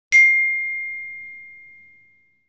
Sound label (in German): glockenspiel (c-dur-tonleiter) pro ton ca. 3 sec.